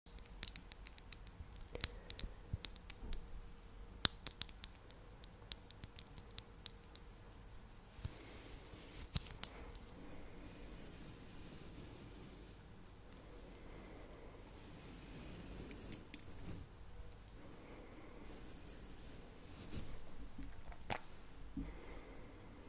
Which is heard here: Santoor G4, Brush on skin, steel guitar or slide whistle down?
Brush on skin